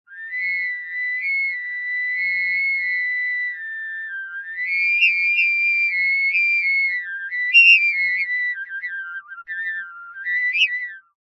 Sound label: foley pipe plastic tube air blow through unharmonic shrill musical tone